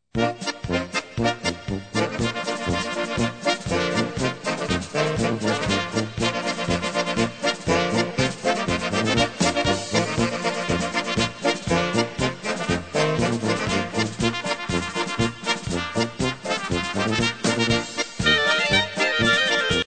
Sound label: polka